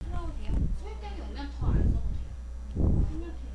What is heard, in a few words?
knife